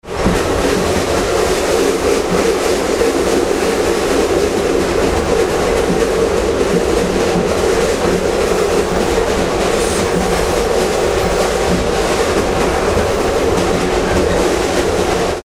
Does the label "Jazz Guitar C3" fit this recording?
No